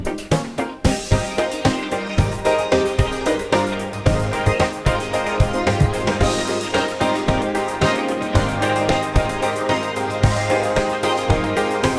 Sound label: loop Funk Groove Guitar in A minor bpm 90